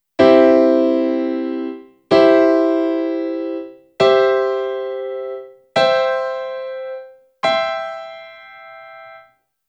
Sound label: c chords